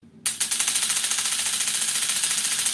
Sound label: reactivate, taser; TaserFramework